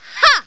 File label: Hah; Riviera; Fia